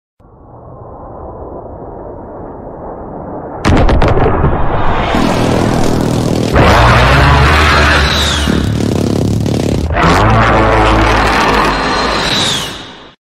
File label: Precision Airstrike